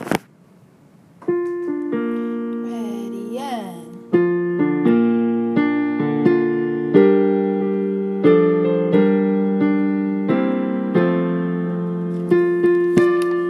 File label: piano only